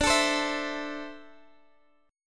D 7th Suspended 2nd, Guitar, Open D

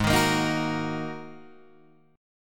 G sharp 7th Suspended 2nd G sharp Guitar Open D

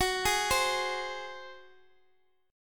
Gb Suspended 2nd Flat 5th

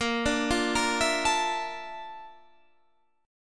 A sharp Major Sharp 11th

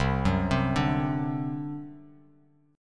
C Suspended 2nd Flat 5th C down Bass Standard; Csus2b5 arpeggiated

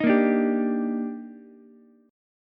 D sharp Major 7th, Ukulele; strummed; JGuitar

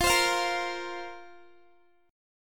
F Suspended 4th F, Guitar; Fsus4; strummed; x,20,22,22,22,x

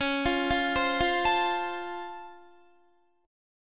Db Augmented Major 7th Db down Guitar; arpeggiated; 4F,4A,5C,5G,5C,6E

